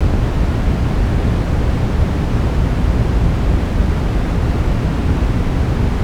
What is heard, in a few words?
room tone; E-MU Producer Series Vol. 3 – Hollywood Sound Effects; Ambient Sounds; Room Tones